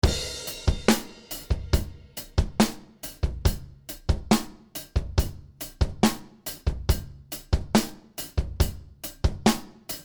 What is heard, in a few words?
Klark Teknik 1176 KT Drums All In